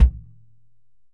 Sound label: Kick, EMU PX-7 Drum Machines